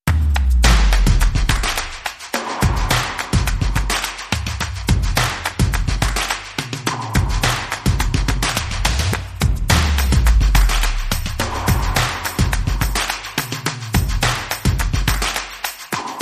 No Agogo Less FX

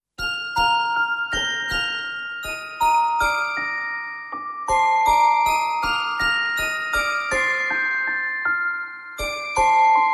Tu Scendi Dalle Stelle, Carillon Theme No Pad

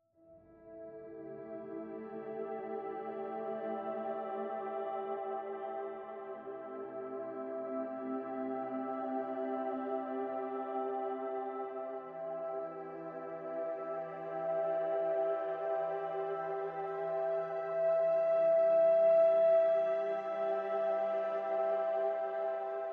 Breaking Waves, No Piano

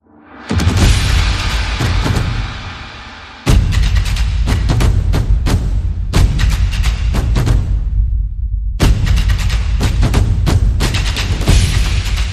Percussion

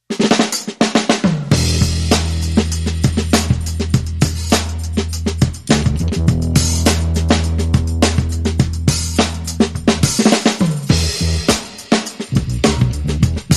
Drums and Bass Mix

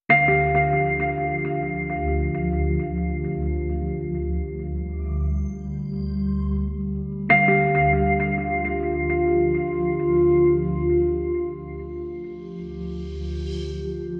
Suspense Film

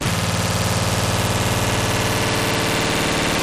Glitch

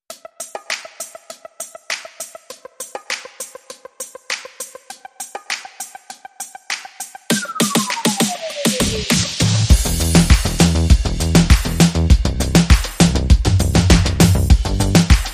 Rhythm and Bass Mix

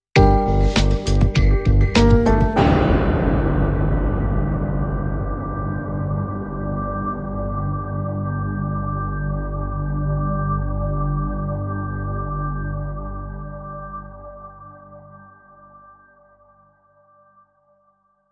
Bumper

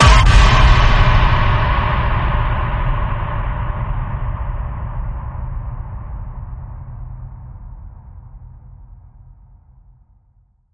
Radioactivity Ping, C

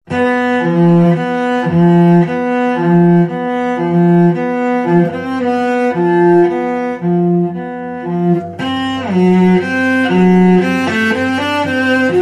Cello Danger Eerie

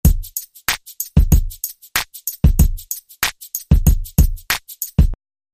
Tipsy Drum Loop

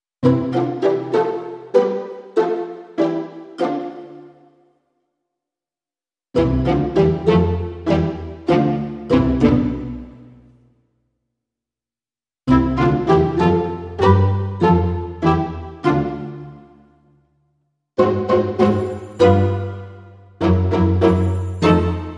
Modern Percussion No Leads

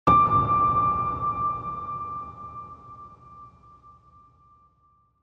Tranquil Hi Piano Ping

